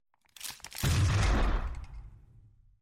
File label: shotgun